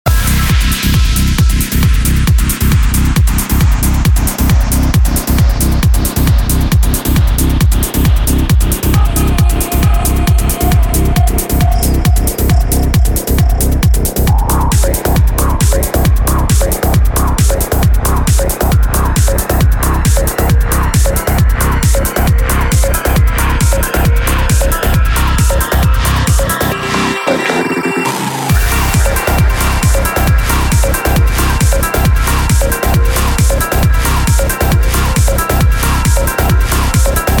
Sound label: Remix